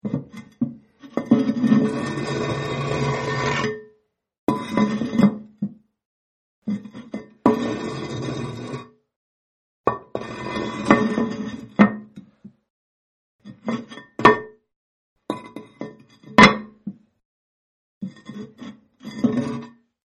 Toilet Tank Lid, Toilet Tank Lid Being Remove And Put Back On; 7 Takes; Could Be Use As Heavy Stone Door Scraping